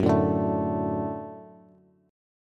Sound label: Gb Minor Sharp 5th Gb Bass; strummed; JGuitar